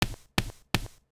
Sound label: dig